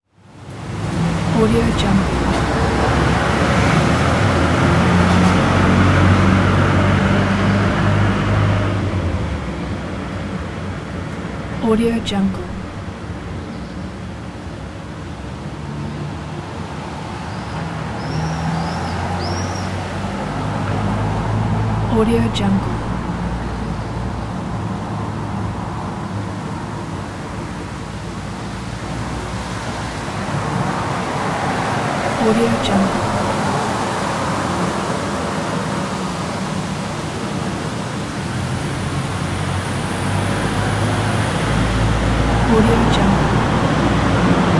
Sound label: city ambience and traffic